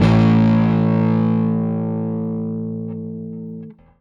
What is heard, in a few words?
Rickenbacker Bass; Airwindows; Rick-Xtras